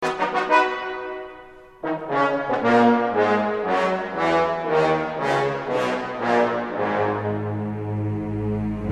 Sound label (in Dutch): Trompet; Rheingold